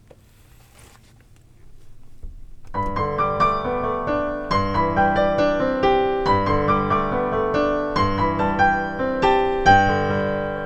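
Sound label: come sail up accompaniment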